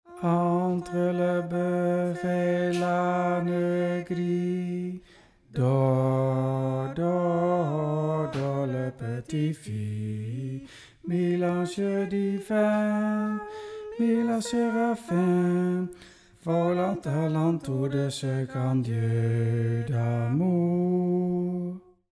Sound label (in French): Entre le Boeuf BAS